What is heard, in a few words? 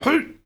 piglette damage; Angry Birds